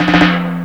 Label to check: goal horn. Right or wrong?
Wrong